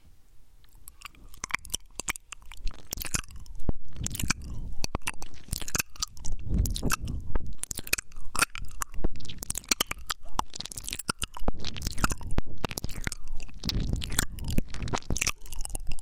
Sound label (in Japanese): 耳舐め音